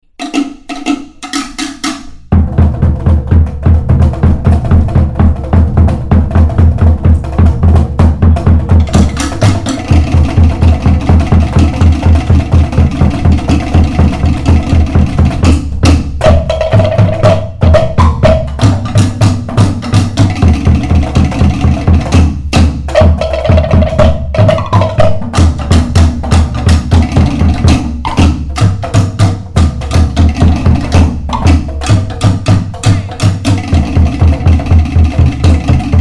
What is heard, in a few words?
beat; polymusic